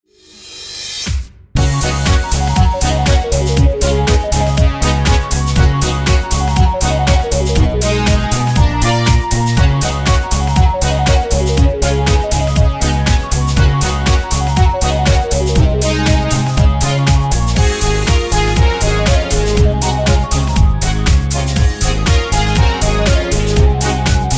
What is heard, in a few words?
Black Keys